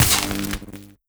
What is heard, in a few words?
buzz zap, electrics; creatures